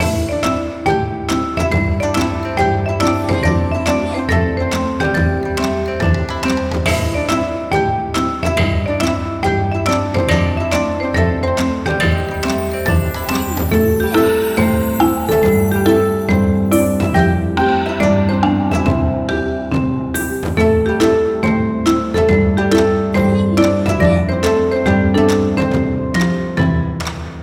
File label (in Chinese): 城池